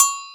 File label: Yamaha CS6, Drums